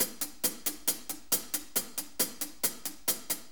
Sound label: 4-4 HiHat Loop